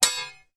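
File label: warfare sword hit another fight; zapsplat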